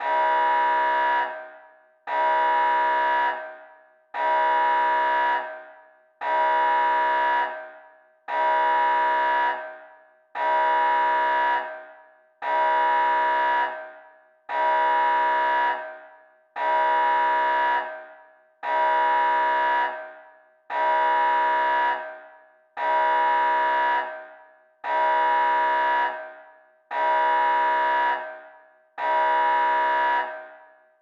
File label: Station Alarm